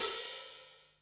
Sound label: hat